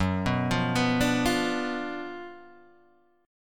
Gb Major 7th Suspended 4th Sharp 5th, Guitar Open G